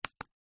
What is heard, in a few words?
salt mill plastic lid on; zapsplat